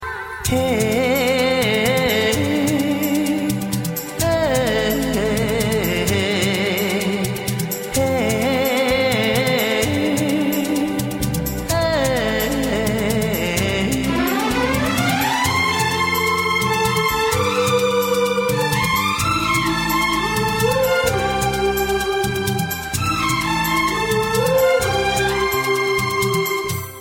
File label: Romantic